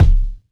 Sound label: bassy kick single hit c sharp key